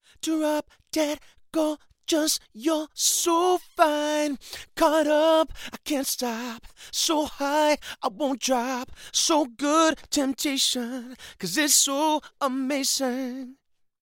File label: male vocals; lewitt